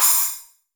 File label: Splash Cym, Cymbals; Roland BK-5